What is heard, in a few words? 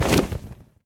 wings, enderdragon; mob; Minecraft